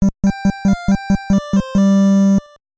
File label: Move Base; genghis khan nes